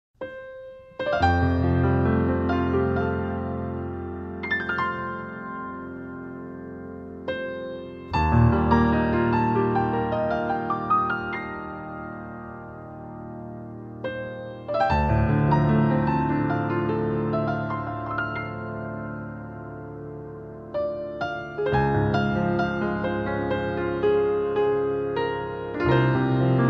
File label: Acoustic Praise Instrumental